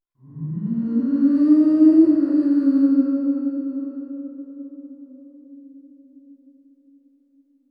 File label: majestic background score